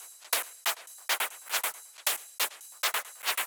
Soothsayer Kit 138 BPM Loop, Drums & Perc; Bminor

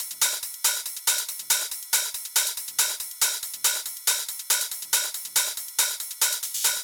Loop 140BPM, Drums & Perc; Vengeance Samples; Incast Kit; E minor

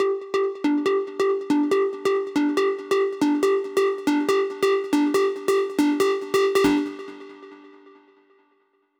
Space Of Time Kit 140BPM SQ Synth Intro, G minor; Vengeance Samples

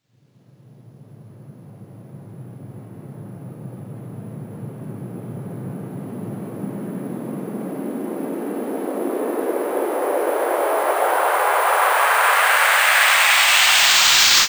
FX Uplifter

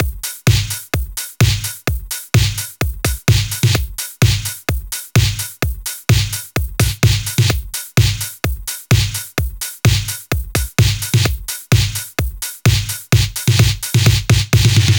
Housebeats 128BPM; Vengeance Samples

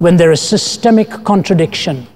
Vocal Phrases; Vengeance Samples; Vocals

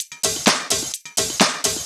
Houseloops 128BPM; Vengeance Samples

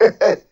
LoFi Vocals Oneshots; Vengeance Samples

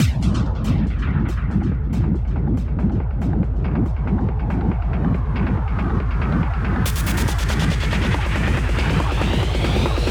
140BPM Drone 16Bars, Hands Up Trance Fills; Vengeance Samples

